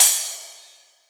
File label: plash, cymbals